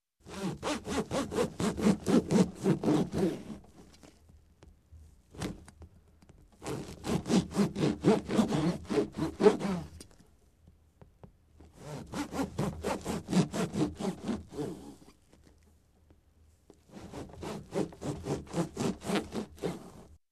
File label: Sawing Wood softly